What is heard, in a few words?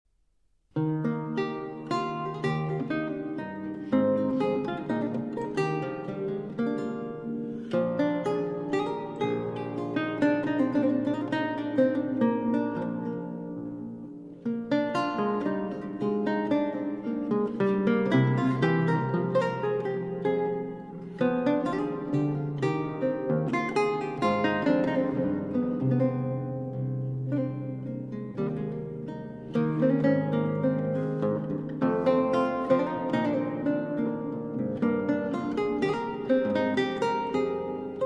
Cello Suite in G Major, Minuet; Cello Suite No. 1